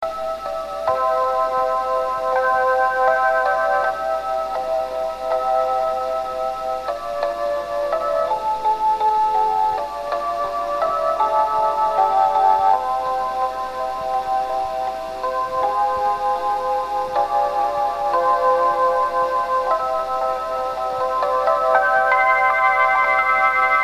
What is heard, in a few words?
kyotobus, chime; 京都バス; メロディー３